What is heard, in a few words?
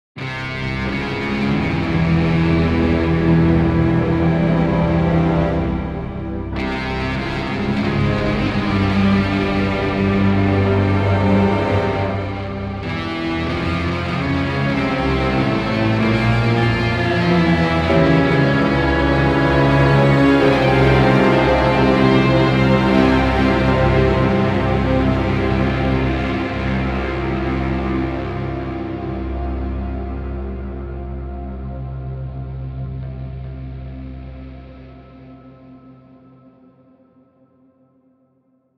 Antedilluvian (With Guitars); StarCraft II Heart of the Swarm